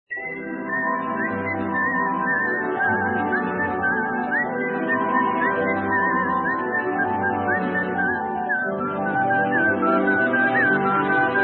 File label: insane whistle; KILL BILL